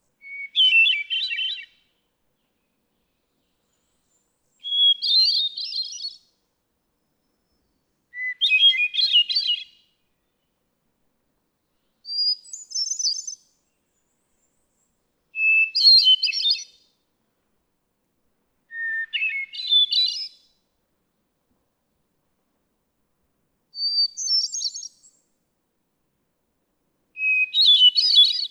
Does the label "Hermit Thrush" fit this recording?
Yes